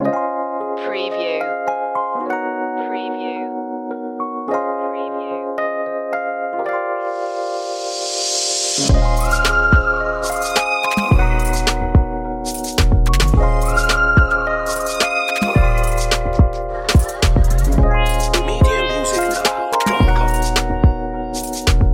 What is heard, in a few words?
hip hop